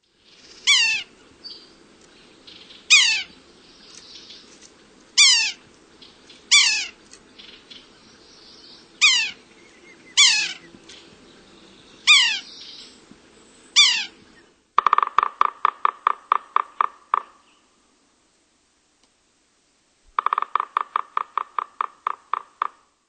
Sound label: Red-naped Sapsucker, Birds